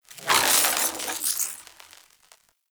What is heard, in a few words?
Voltaic Weapon Electricity Whoosh; Weapons; Enhancements; Elemental